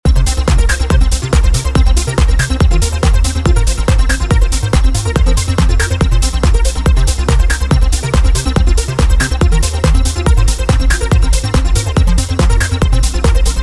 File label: Turbo Square 80 and Minor Turnover; neo retro 8 bar loops and midi files